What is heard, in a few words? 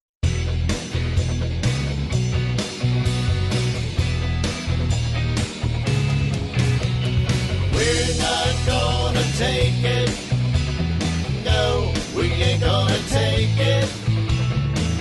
background vocal, square dance music